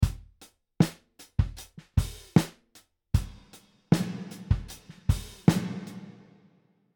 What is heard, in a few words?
Snare Large Room; Reverb